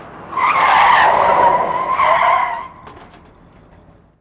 Skid And Stop; Vehicles; Cars & Trucks

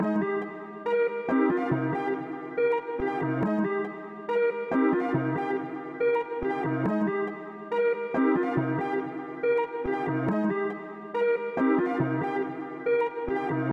140 BPM C Min Pluck; Cymatics; Gems Free Teaser Pack; Cymatics Gems Vol 5; Just Us

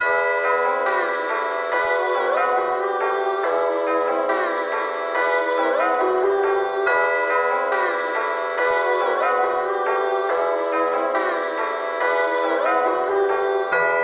140 BPM E Min, Melody, Drill; Cymatics; 2022 Melody Collection

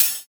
Raptor Open Hat, Dubstep; Drums; One Shots; Cymbals; Hihats; Cymatics; Dubstep Starter Pack

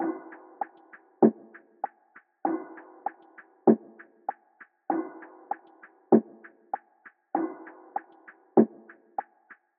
Drum Loop 98 BPM Percussion; Loop Stems; Cymatics; Vibes II Drum Loop Collection; Trails

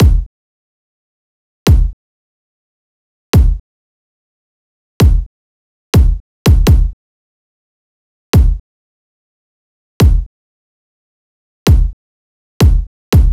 Drum Loop - 144 BPM Kick, future bass; Drum Loops; Cymatics; GHOST (Beta Pack); Brisket Drum Loop

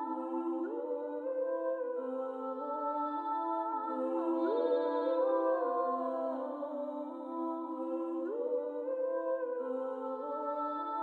126 A Min Vocals; Loop Stems; Cymatics Gems Vol 1; Purple Dreams; Gems Free Teaser Pack

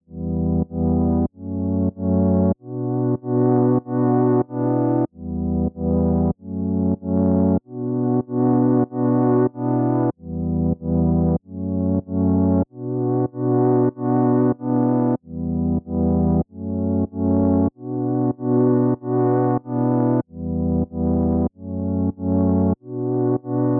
95 BPM F# Min Pad, Latin; Cymatics; PARADOX II; Melody Collection; Amazon

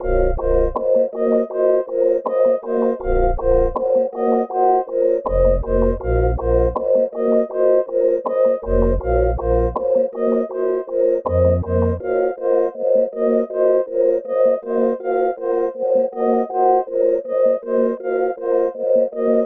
80 BPM A# Min, Melodies; Glyph; Cymatics - Immortal Production Suite Beta

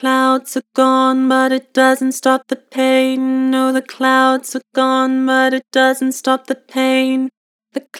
Vocals, Flat, Clouds are gone; Harmor

